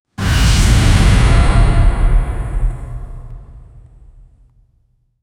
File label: dark magic appears; accumulation